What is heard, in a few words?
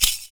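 PERC, percussions; Univers Sons - Raricussions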